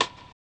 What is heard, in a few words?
RAGGA; Spectrasonics; Metamorphosis; RAGGAVYNL